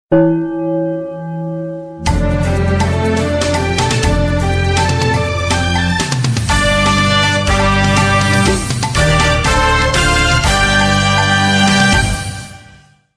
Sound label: Gong; Movie Begin